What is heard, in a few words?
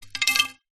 sport pool cue drop hard ground